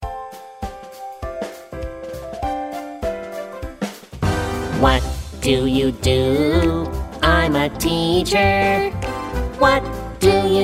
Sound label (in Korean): 영어남 동요